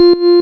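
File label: analog delay, ambi bleep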